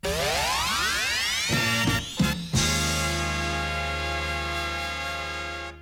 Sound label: topformat maxi jingle single